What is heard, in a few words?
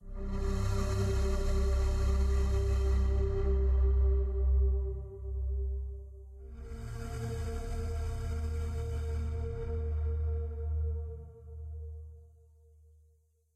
Suspense Theme; Dt D Ministry X Suspense Theme